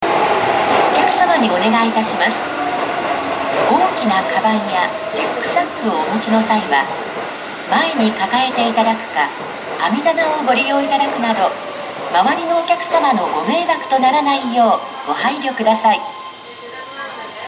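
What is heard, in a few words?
chushojima departure warning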